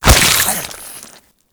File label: Infested Face Hugger Latch; Warframe